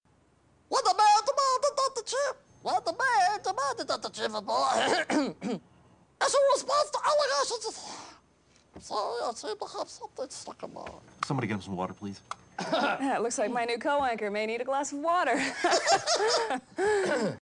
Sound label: Frog in throat